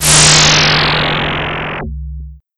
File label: Glitch Synth